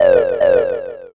crow

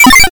glitch

